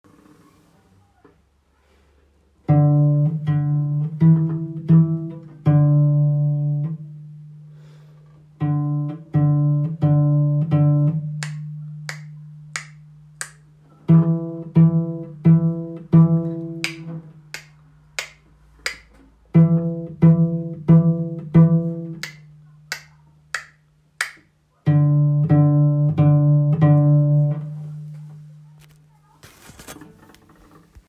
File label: pizzicato, cello; gezupft